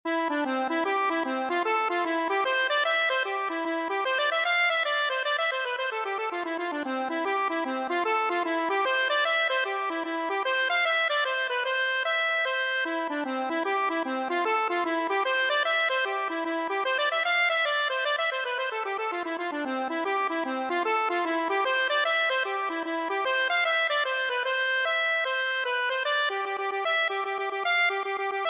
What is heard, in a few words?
higgins, accordion